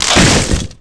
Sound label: break shingles; hits; Tony Hawk's Project 8; XBOX